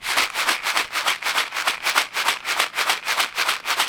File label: Foley